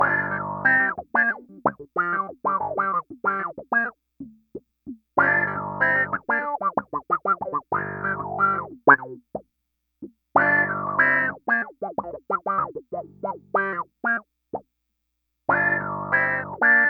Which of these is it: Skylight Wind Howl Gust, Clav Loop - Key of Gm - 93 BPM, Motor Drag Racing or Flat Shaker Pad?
Clav Loop - Key of Gm - 93 BPM